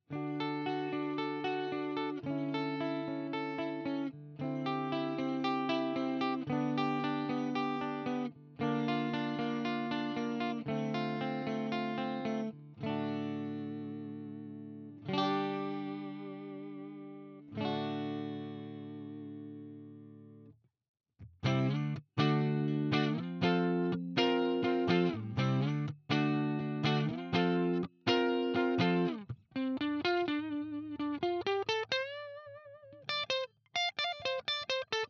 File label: Gretsch White Falcon clean neck